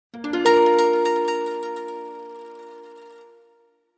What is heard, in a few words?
Success